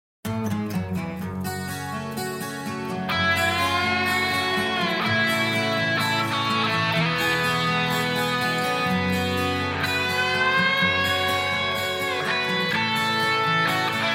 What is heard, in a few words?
tone ringtone; iphone